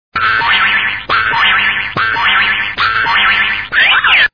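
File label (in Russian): повторяющийся мультяшный звук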